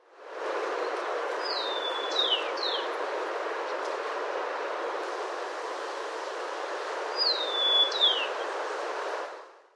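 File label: Rufous Collared Sparrow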